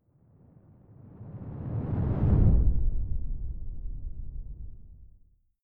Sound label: whoosh simple low long grainy; swooshes-swishes